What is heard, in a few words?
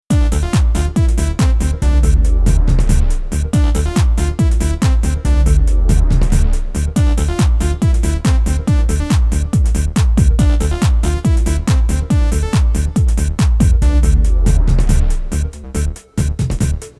rhythm